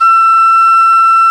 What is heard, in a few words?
P WHISTLE, Old World Instruments, Winds